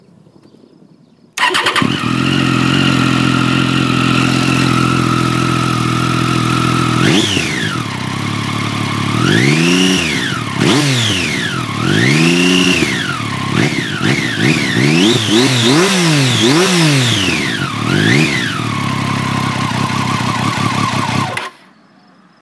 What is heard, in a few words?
Street RX